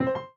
PUZZLE Success Piano Three Note Fast Bright Soft Dry; stereo; Universal Sound FX